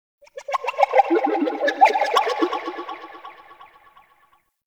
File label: Ocarina; Warframe; Ambience; Nokko Colony